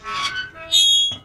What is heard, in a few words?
metal door creak